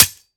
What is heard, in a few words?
melee world knife cement; viper